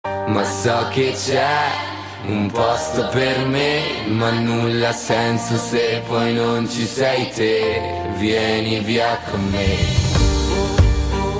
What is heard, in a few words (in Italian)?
Carillon